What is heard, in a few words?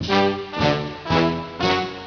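trumpet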